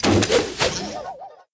Dummy Recoil

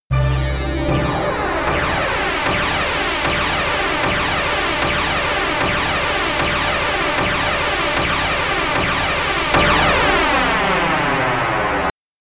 Boss Approaching; Olteus 2; x68000

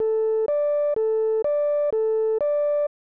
Tile Match (Siren); Shanghai 2 Dragon's Eye; snes

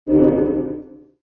Button; Bubble Tanks 2

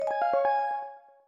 Bronze Jingle

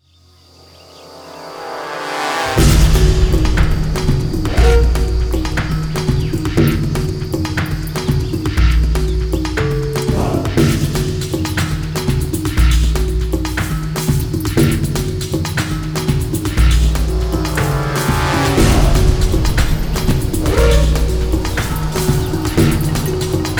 jungle battle; Impossible Creatures

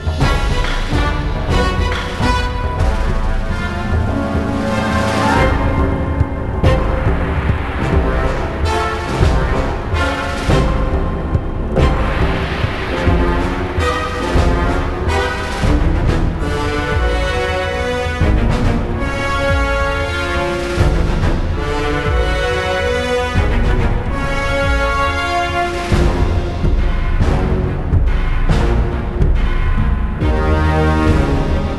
Music; legend of the guardians the owls of ga hoole 2010 nds

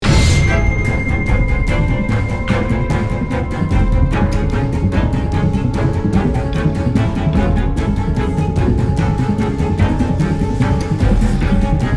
Action loop

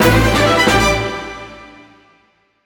Battle Fanfare; Fire Emblem Echoes Shadows of Valentia

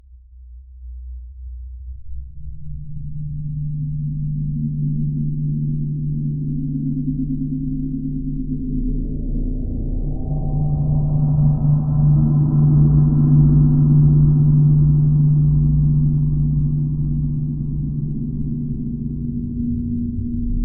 Ambience The Factory; Five Shows at Wario's Director's Cut; PC 2021

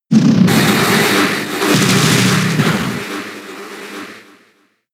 Echoed Voice; pokemon sfx gen 6 attack moves xy oras

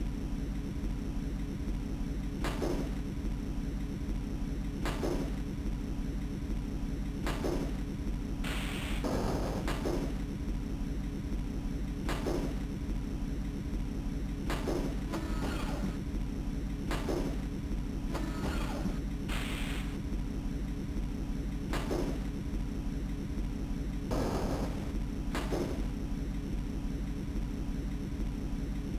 Ambience; Lilo & Stitch; GBA

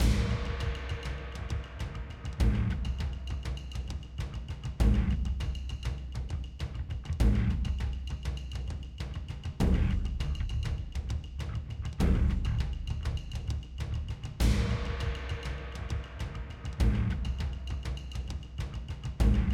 Combat Low Low

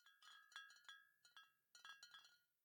Bottle Shake; The Town

